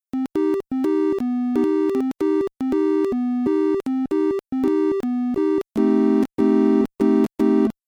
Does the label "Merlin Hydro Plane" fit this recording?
No